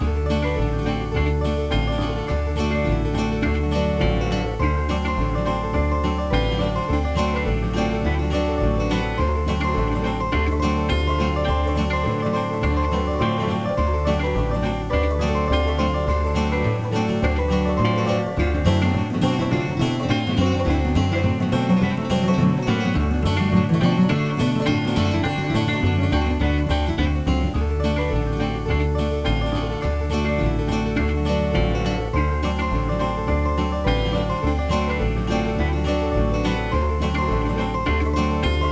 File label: Bonus; Extreme Hunting; Dreamcast